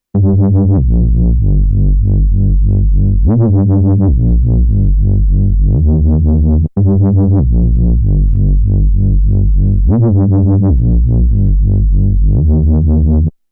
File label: KORG Node; Mr. Reese